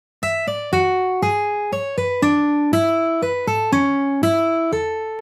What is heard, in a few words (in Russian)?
Мелодия рингтона Nokia